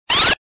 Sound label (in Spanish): rafaga